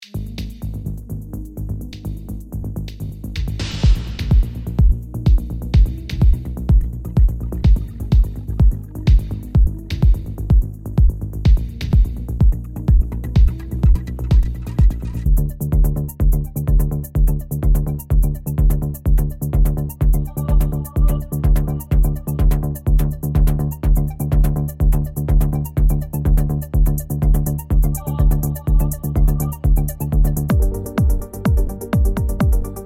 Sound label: melodic techno